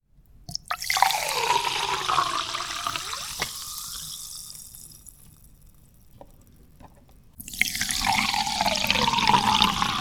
Pouring Mineral Water Into A Glass

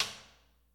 Prac Perc

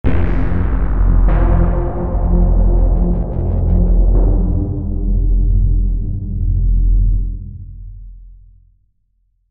Jump scare

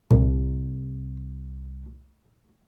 Double Bass C 2 Pizzicato Non Vibrato

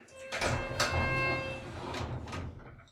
Elevator Open Close

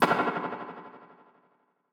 Echo Bonus

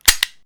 Empty Gun Failure To Fire Trigger Pull

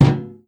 Metal hit

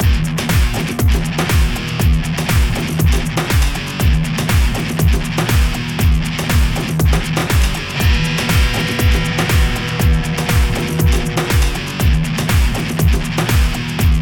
Trashy Loop

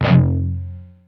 Muted F 5th

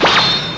Anime Shing

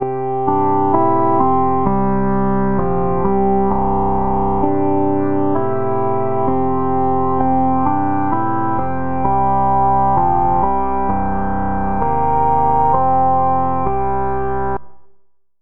Zen Synth, 130

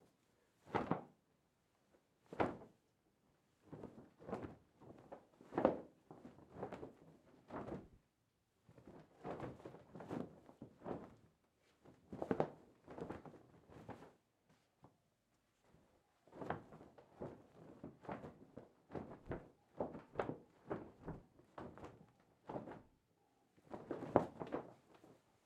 Flag Wave

